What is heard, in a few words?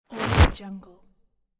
tear whoosh